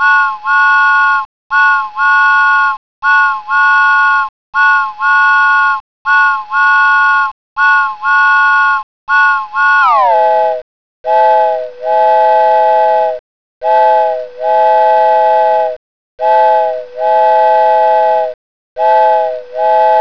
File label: doppler; physics